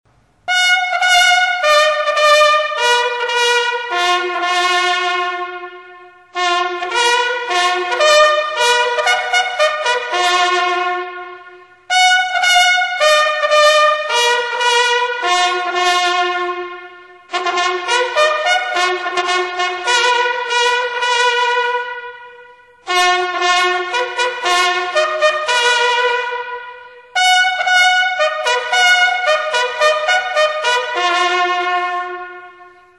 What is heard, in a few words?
Sunset, navy bugle